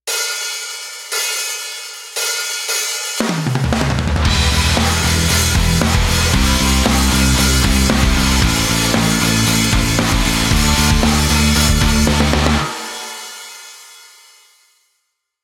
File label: karaoke